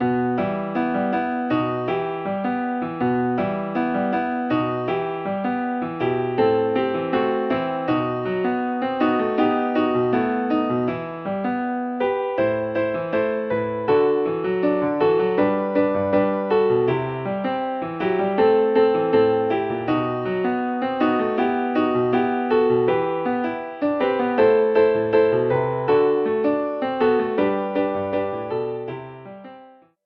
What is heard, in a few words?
accomp; The Lord Now Sends; hymnary